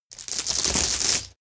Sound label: metal chain drop on ground